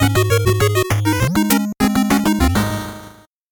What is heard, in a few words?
8bit jingle fast